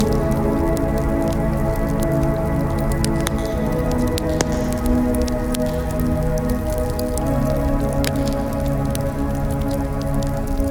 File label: fire